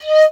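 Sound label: piccolo staccato D#4; Flutes; DirectWave; Varazdin Orchestral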